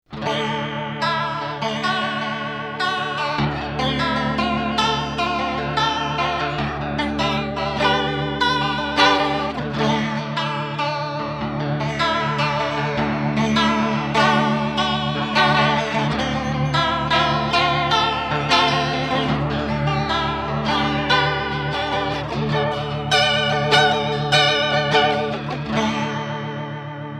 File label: Maxon CS9Pro tremolo chorus effect